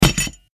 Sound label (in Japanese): 銃 を 落とす